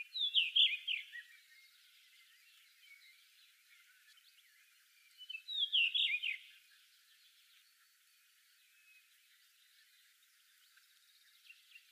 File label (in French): merle bleu